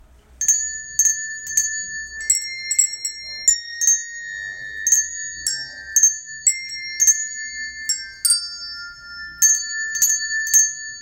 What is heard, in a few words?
Angels we have heard on high Bells